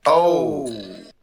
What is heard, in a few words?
"oh"; Voice